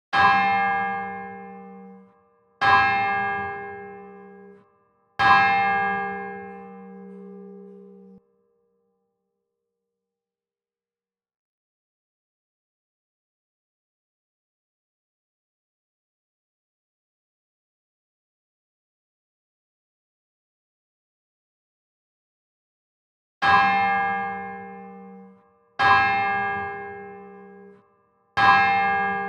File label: regina prayer bells; regina coeli